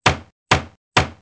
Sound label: man panel hit; Soldier Of Fortune II Double Helix; XBOX